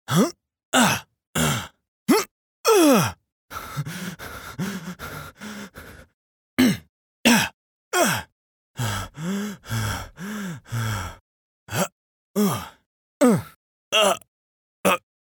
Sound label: Efforts; en-GB